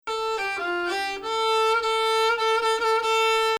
Bayati Samai Husseini Nahawand, includes dip down to 3 under tonic of 4; Samai Bayati il-3ariyan; Husseini 5 (Nah.); includes dip down to 3 under tonic of 4 (also typical)